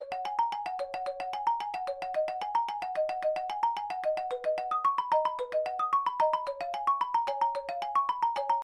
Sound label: Xylo Ring